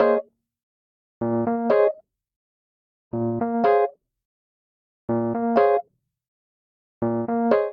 Vamp Chill Upbeat Bb Minor 124 bpm, Electric Keyboards; Ableton; Factory Packs